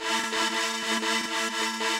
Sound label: 120BPM SWING; Bolder Sounds - Granular